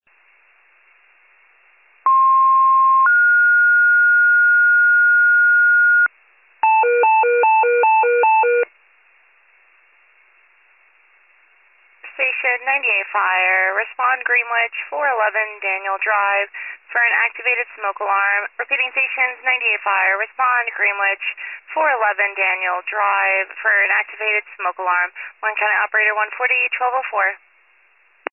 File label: fire, pager alert